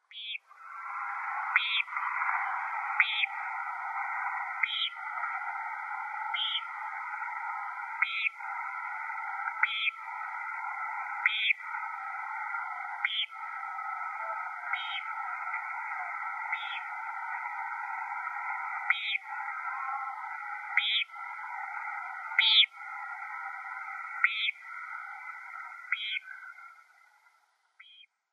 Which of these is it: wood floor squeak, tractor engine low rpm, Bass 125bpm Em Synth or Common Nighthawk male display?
Common Nighthawk male display